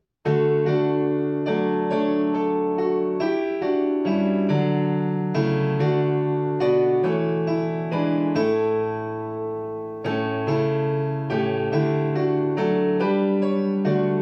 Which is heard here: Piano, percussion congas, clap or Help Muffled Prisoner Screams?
Piano